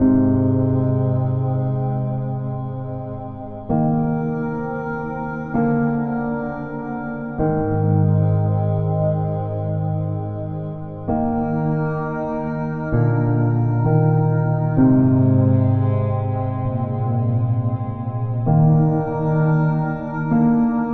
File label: Sad Piano Ambient